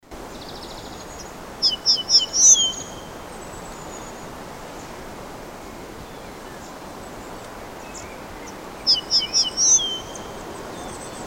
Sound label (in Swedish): Rostsparv